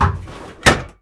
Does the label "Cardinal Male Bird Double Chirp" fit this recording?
No